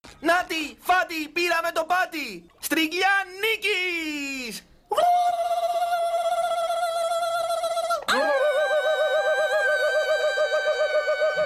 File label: nutty farty